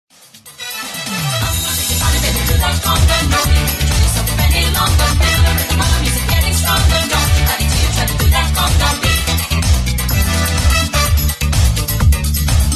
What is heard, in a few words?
Conga